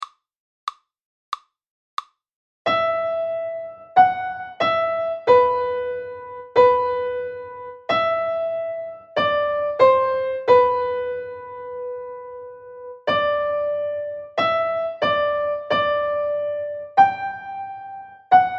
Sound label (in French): hymn voix 3, saxophone; hymn trio